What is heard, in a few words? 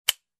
Lighter clicking sound